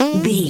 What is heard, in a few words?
cartoon boing jump